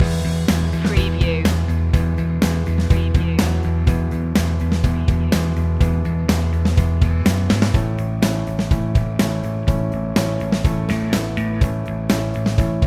underscore loop

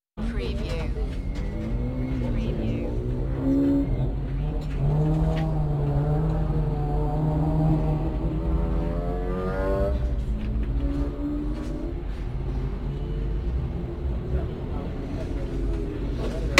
Rally pit ambience; beanstalk audio

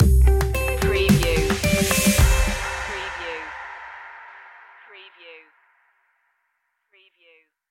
that feeling stinger

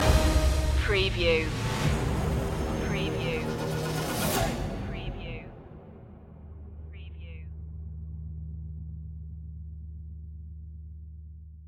dark destiny march stinger

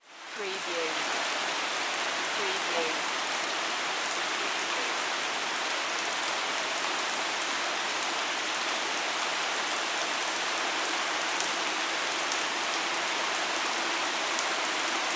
Rain Pour Ambience

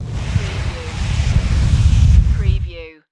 Flame Whoosh

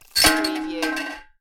Metal Junk With Chain Clanks Rattle

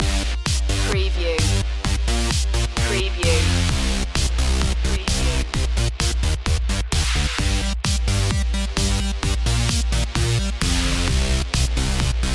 retro platform jumper loop

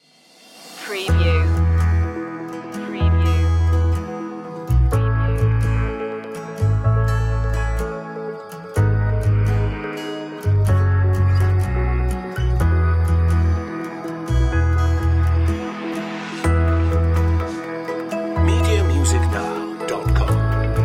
positive flow no drums

